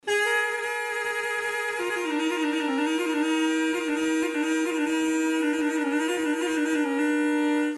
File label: Arghul